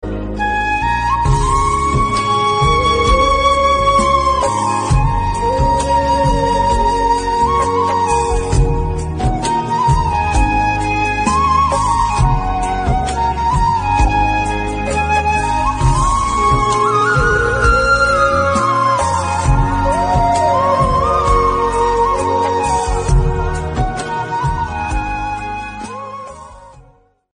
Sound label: sad bansuri background instrumental music tone; samsung ringtones